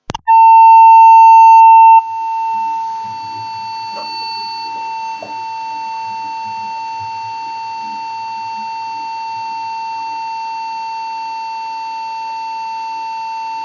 recording body microphone holding cable and amp cable hold jack and jill tone 15000hz